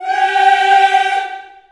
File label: G4, Choir; USB Soundscan vol.28 - Choir Acoustic & Synth; AKAI